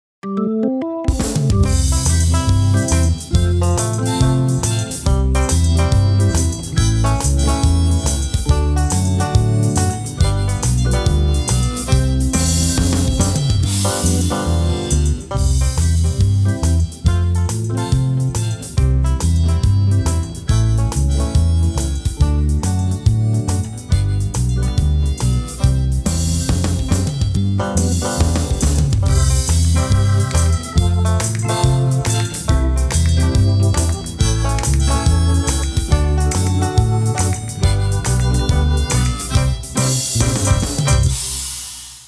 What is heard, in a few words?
break song